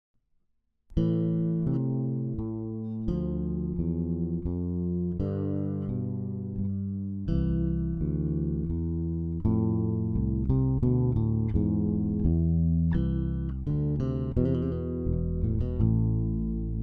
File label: bass